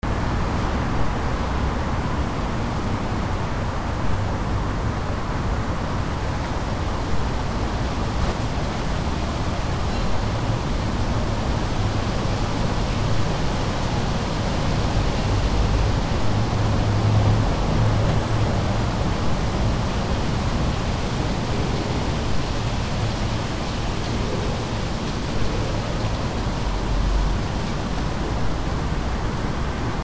atmo germany berlin early morning courtyard

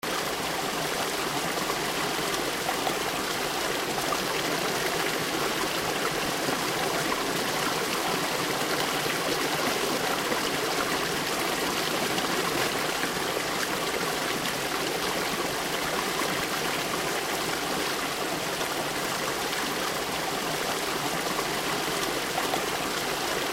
atmo az prescott flowing stream

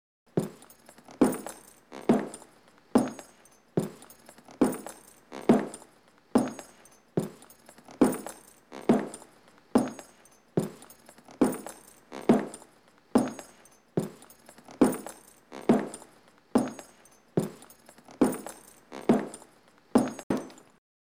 footsteps unisex boots with spurs

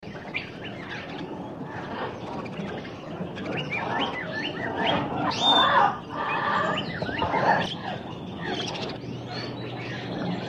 tropical birds in pet store

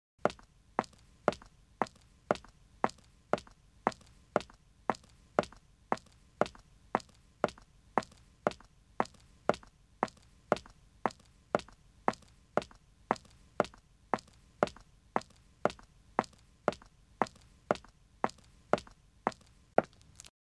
footsteps woman pavement

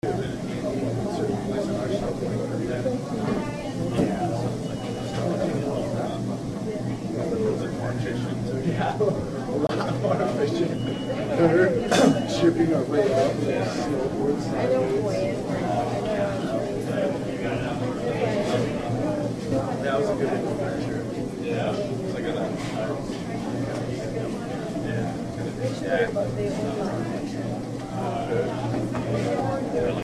california restaurant crowd

